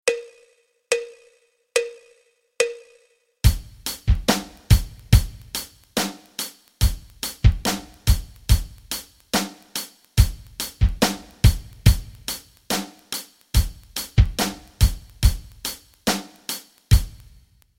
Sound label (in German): rock rhythmen